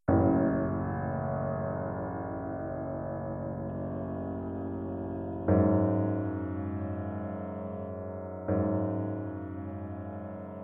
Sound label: piano